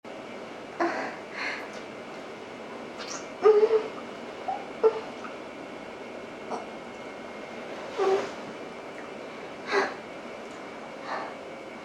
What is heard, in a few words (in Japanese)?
サンプル音声 耳舐め